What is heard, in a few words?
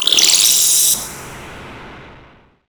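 synth, Production Effects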